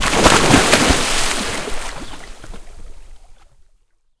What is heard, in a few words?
landing; Waterraft